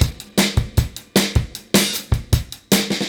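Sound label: 155 bpm, beat loops; Zero-G; Monster Beats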